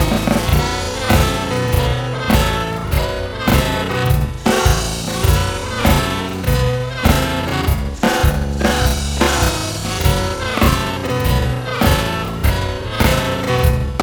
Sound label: swing